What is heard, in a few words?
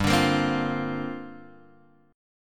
G Minor 11th G; Gm11 Chord {3 X 0 3 1 1} strummed